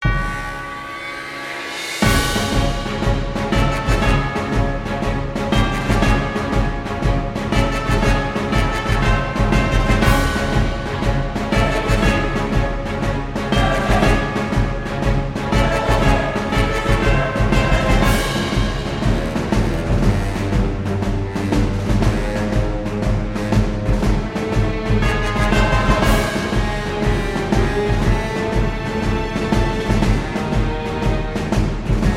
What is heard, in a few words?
bgm desert battle boss; world desert